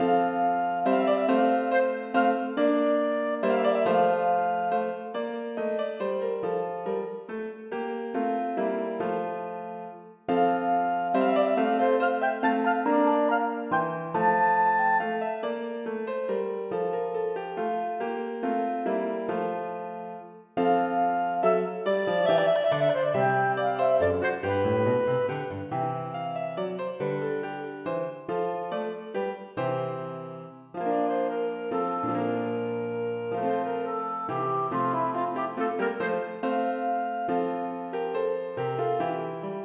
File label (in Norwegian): Menuet for klarinett og piano; Aamodt 015c